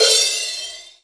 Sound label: Crash, Cymbals; Vengeance Samples